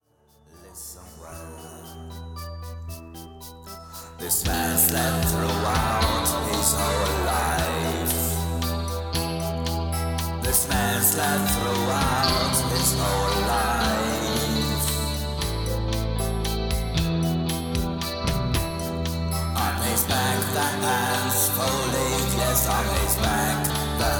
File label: Lullaby